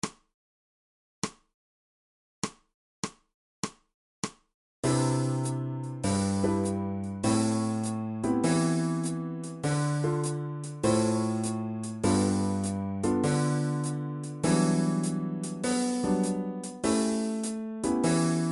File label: 2beat, fretless bass